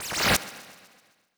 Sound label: teleport middle appear